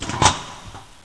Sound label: METPOP, Schiocco metallico